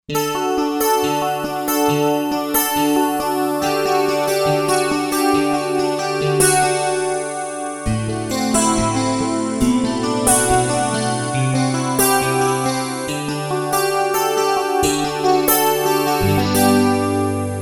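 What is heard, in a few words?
Guitar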